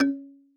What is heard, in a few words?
marimba kalimba; ゆめ2っき